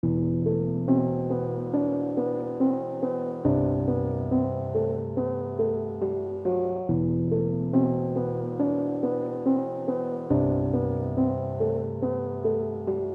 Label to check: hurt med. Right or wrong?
Wrong